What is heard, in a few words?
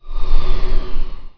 infil motor; Emperor - Battle for Dune